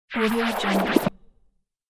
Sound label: sliding spinning falling